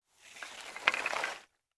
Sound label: Wood Debris Movement Slide Cut Pieces; Materials; Foley